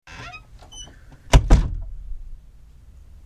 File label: door room